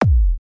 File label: deep hit